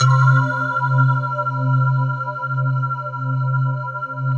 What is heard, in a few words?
SYN K-2000, Roland